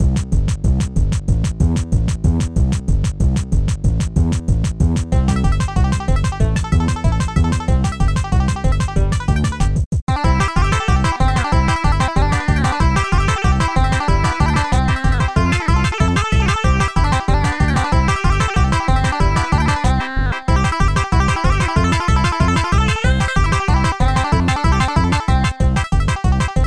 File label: techno